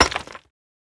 Hit Rock; GrandFantasia

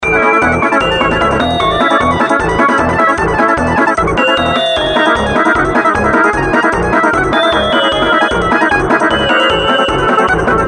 wam music; Left 4 Dead 2

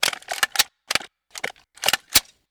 smg reload; Ace of Spades

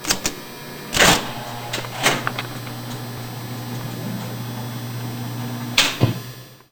record changer; Left 4 Dead 2

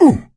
hurt minor, survivor voice; Left 4 Dead 2; coach

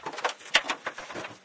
shuffle, materials; Garry's Mod; hl2

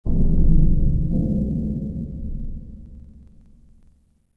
Space Junk Bump; Sid Meier's Civilization 4 Complete; Beyond the Sword

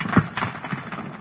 building rubble, streetwar; ambient, levels

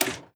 click expand; Hearts of Iron IV Death or Dishonor

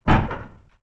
Mech Metal; Sid Meier's Civilization 4 Complete; Beyond the Sword; Units

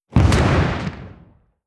light cruiser attack; Hearts of Iron IV Death or Dishonor

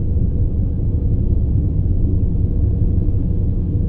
tone rumble; Duke Nukem Forever

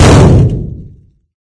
door hit, materials; Garry's Mod; hl2

